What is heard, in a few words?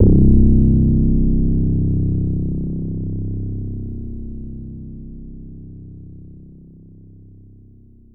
bass